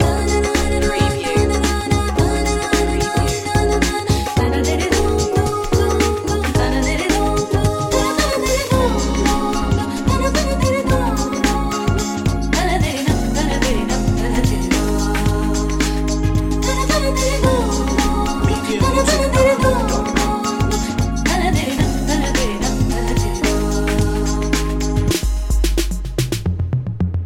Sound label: hindu pop loop